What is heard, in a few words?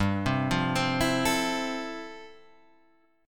F Major 7th G; Fmaj7/G arpeggiated; JGuitar